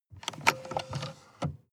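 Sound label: sports car interior drink holder open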